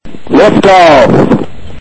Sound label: liftoff